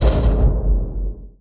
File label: dark impact; cask